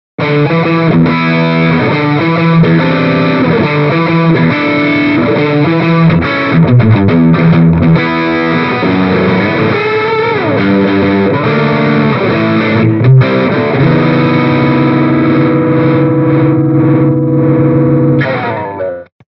Mini Rox Mic Inside Nordik Gjallarhorn Distortion Stratocaster